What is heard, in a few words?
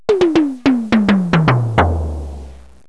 Elec TOM